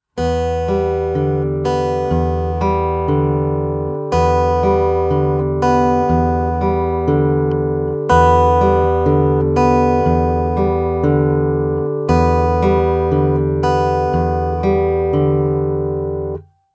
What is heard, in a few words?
Fingerstyle, acoustic guitar; alternating bass fingerstyle pattern; Nigel Wheeler